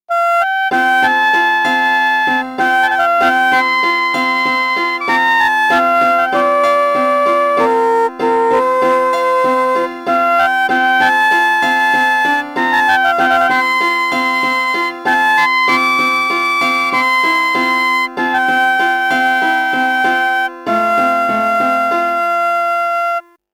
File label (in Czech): melodie